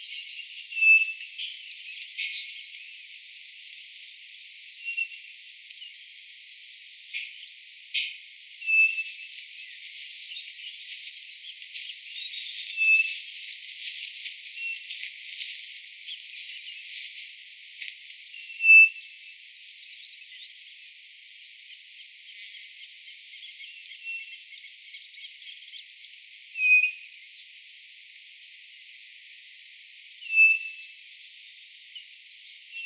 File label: nothura boraquira